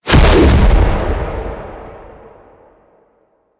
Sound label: Blast; Focus; Naramon